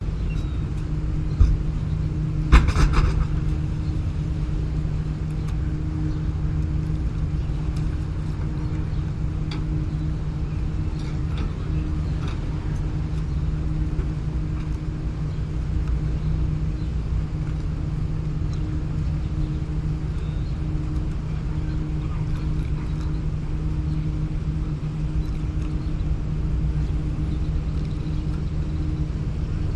market dock seagulls boats plane water thud dockworkers metallic; vancouver; lonsdale quay; metallic noises; your free sounds